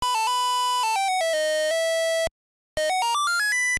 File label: jingle; G&W; DKJR